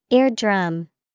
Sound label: ear drum